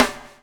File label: BUBL, BUBBLE; Spectrasonics - Backbeat